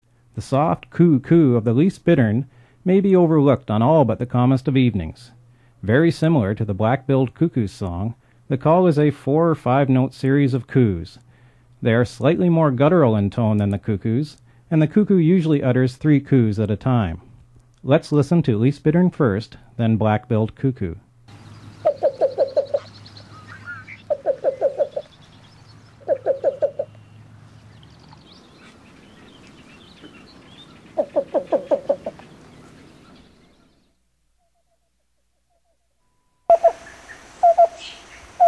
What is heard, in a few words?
Least Bittern